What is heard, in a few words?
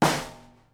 SNARE, Live Snares